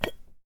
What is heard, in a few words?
teapot open